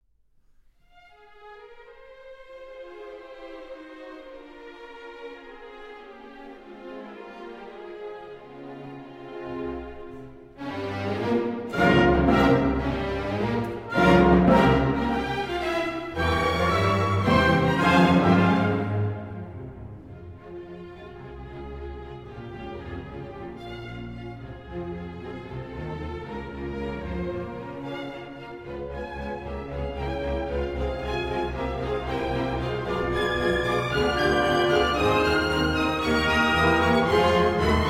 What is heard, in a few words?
Piano Concerto In F Minor Maestoso